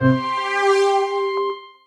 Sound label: Glitterbox; Ableton; Retro Synths; P-50 Linear; P-50 Structures